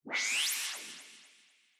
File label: Menu SFX Level Transition Out